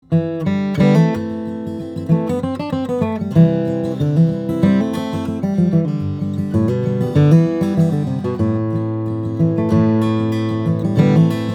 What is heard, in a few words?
Martin D-45, guitars; indian rosewood sitka spruce; flattop